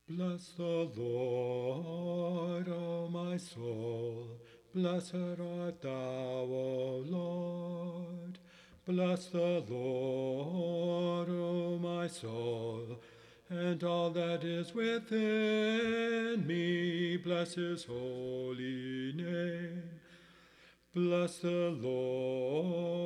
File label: first antiphon bass